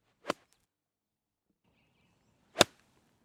Golf Iron Shot Sound

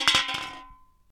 Can Falls On Floor

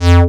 Machine Loud

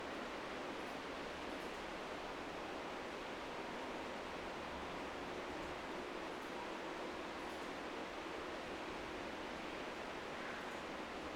Thunder Johor Bahru Triple Layer

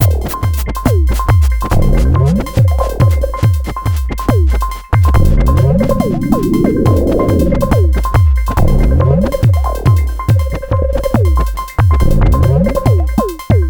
Attack Loop, 140 Bpm; Mastererd 16 Bit

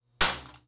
Metal Coup

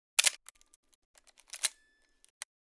Canon AE1 shutter press and film advance

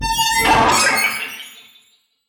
Horror

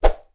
Sword Swipe

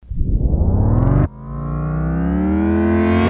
Tape Rewind

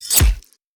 Sword Stab Body Hit

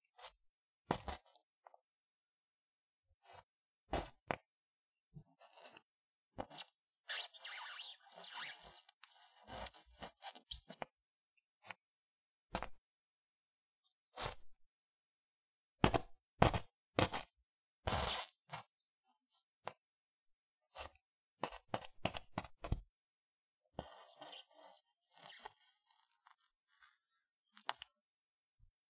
Polyester And Knife

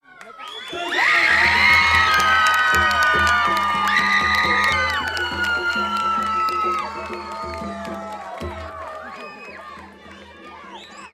Massive Scream

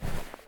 Fabric Foley

